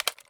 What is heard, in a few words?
mp5 bolt out handle; Escape from Tarkov